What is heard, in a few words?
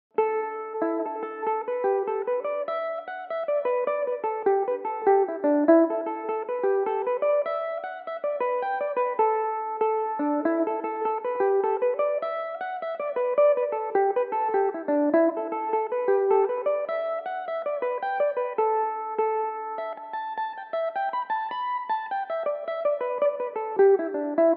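A dorian, Irish mandolin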